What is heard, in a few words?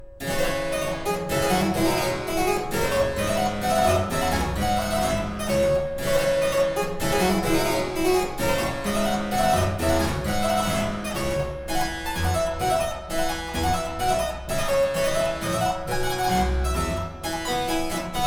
Suite No.7 In D Minor Hornpipe, Harpsichord; Purcell edition